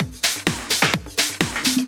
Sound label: Housebeats, 128BPM; Vengeance Samples; VEH2 Loops